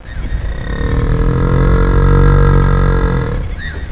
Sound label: moo